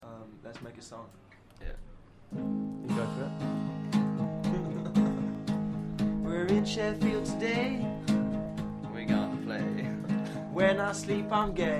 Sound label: impromptu song